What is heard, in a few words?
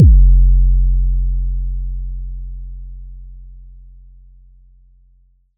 roomy bass drum sample f key; drum single hits one shots; kick drum samples